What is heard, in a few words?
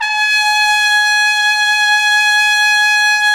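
brass, Tpt F, Solo Ambient; Roland L-CD702; Tpt 1-4 Solo; Tp 3 Ambient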